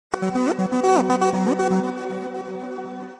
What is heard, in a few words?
Vivo iQOO 12 SMS Ringtone